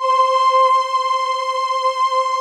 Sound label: VP-330, String Machines